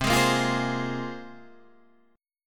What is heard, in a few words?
C 7th Suspended 4th Sharp 5th